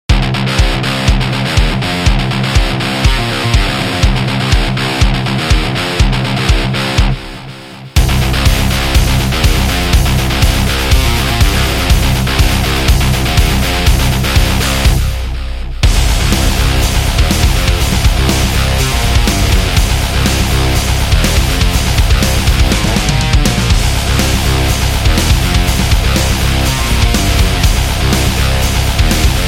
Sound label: Metal Power